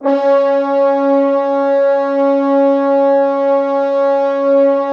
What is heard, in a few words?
Orchestral Brass